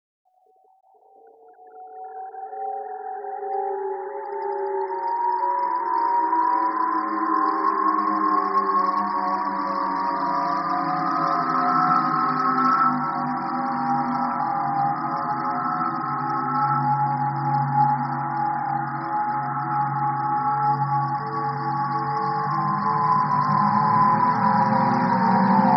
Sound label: ODD TONES DELAY BLUR; Sonic Foundry (Sony Creative Software); Vir Unis Infusion